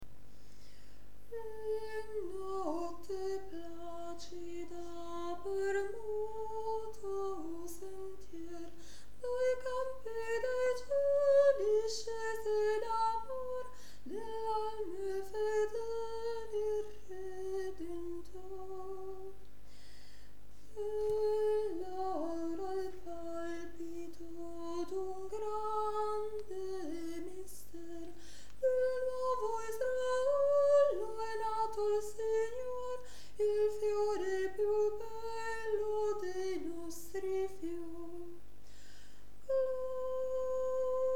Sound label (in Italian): Soprani; In notte placida